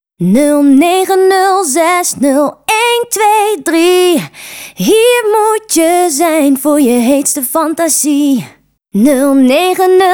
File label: Jingle